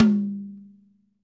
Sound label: TOM H, LA TOM